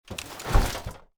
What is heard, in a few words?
Equip Down, FatMan; ARMES